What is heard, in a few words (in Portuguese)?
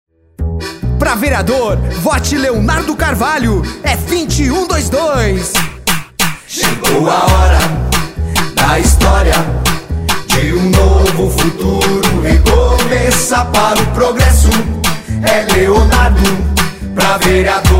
jingles politicos